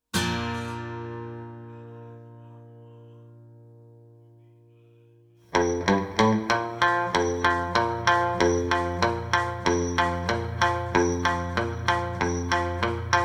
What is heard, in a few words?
guitar